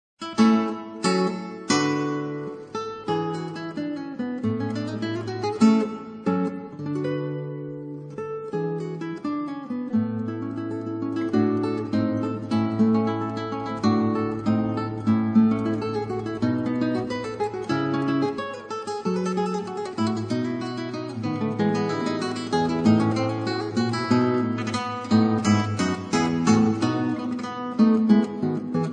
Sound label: Sonate A-Dur, Gitarrenduo